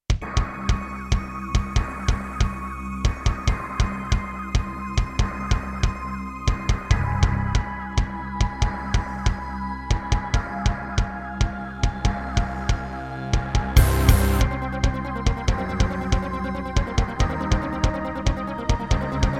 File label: Yamaha MU90; Doom (Sigil)